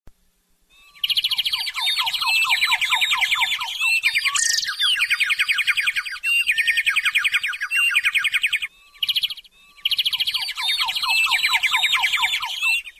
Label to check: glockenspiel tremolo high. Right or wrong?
Wrong